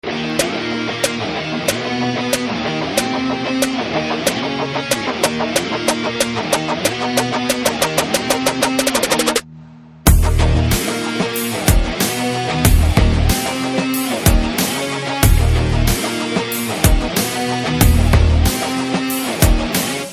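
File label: background music Telugu Ringtone; VakeelSaab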